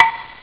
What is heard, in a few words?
pling; ST-XX Amiga Soundtracker Sample Packs